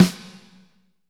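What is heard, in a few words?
SNR snare, Ballad; Northstar - Drumscapes Roland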